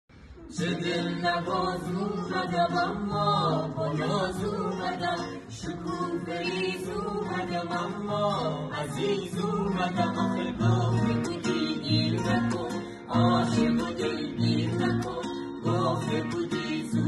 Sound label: Guitar